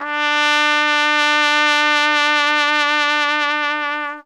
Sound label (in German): TRP WEICH, Alpine Volksmusik; Best Service